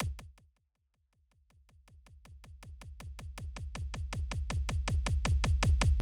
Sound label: kick